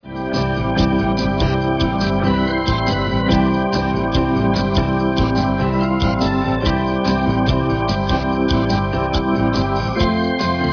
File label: Hammond